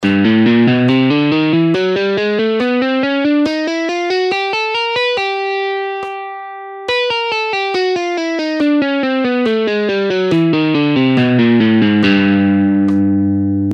Exercise 3 In Half Speed, guitar warm-up exercises